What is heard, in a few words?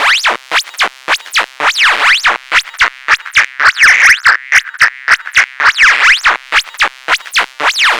Noise, LFO & Noise